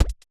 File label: reel Bounce